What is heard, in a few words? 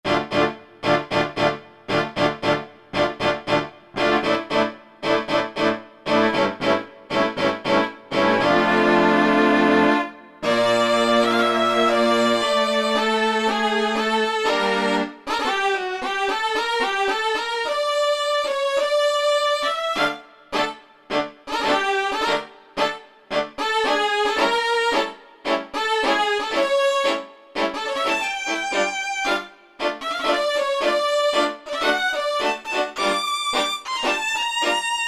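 strings Viol Duo; E-mu Proteus FX; Factory Presets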